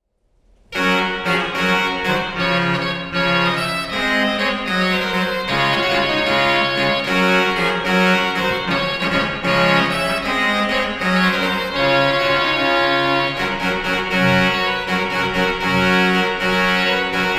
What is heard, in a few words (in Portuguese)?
Orgao Arouca; PureSound